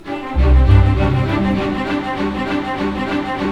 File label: BIKE; East West; Scoring Tools; ORC; Bike Ride C